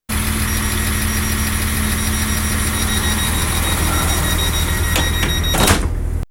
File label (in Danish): Arriva LINT-41 dørlukning; Arriva Lint 2046; Silas Lang, 14. december 2024